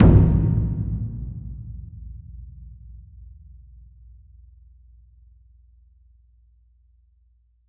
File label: correct